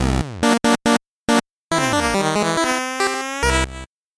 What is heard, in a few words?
8bit jingle normal